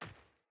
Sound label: Sandals Run Dirt, Footsteps